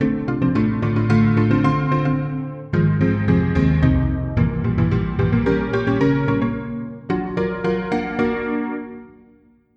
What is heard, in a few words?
Piano Metal Fantasy; PianoV; Xioni